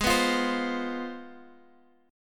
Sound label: C Major 7th Suspended 4th Sharp 5th, chord